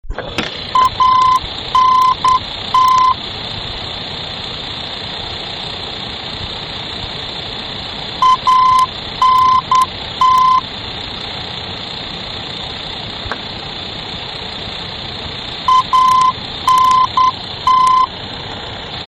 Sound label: VOR, aerobeacons; belgium